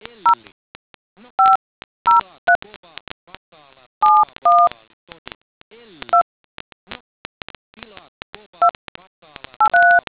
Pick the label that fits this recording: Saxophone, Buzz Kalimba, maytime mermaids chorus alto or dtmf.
dtmf